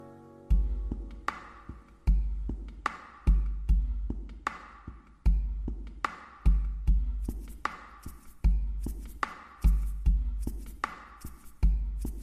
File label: drum ringtone